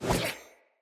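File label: Sword Whoosh right to left; Weapons; Player; Swords; Fey Sword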